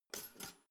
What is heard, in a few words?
toaster pull up soft